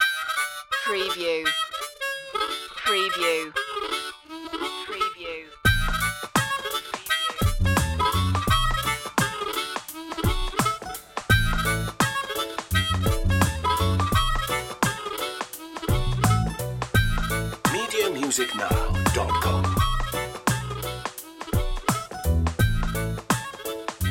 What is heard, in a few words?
kids reggae